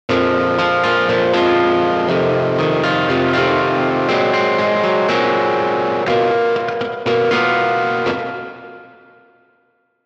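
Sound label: Electric guitar riff; Eventide EQ45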